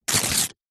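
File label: household instructions pamphlet glossy paper rip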